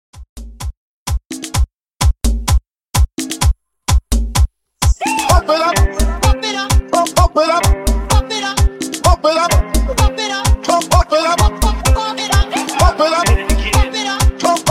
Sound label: Dance Hype Re Drum 128